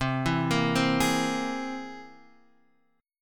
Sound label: C Augmented 7th, Guitar, Half Step Down